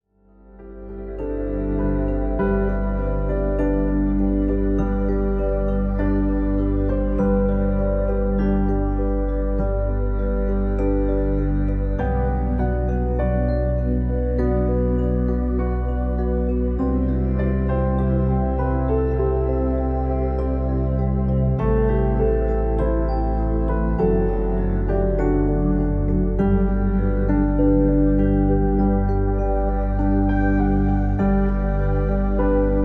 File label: Deep Relaxation 3D RELAX